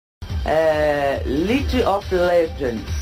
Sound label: eeeehhh